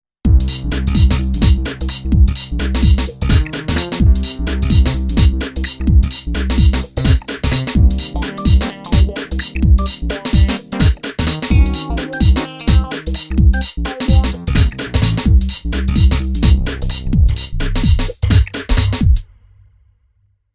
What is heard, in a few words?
Funked Up Garage